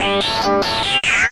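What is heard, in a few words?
guitar loop, 145 BPM, drum & bass; Ueberschall; Drum & Bass Flash Trackz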